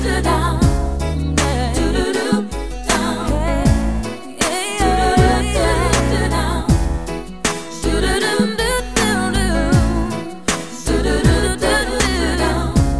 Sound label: pop